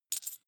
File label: coin big drop soft